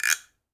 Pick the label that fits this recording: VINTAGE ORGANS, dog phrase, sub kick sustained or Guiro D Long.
Guiro D Long